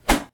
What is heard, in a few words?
Attack Punch